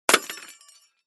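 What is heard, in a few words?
lamp broke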